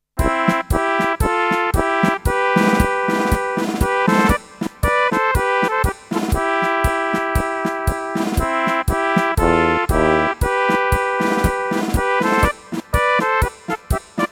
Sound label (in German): Flügelhorn Drum